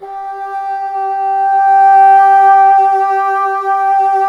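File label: wind bassoon G0; Miroslav Vitous; Solo Instruments VOL-1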